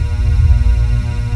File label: phase gate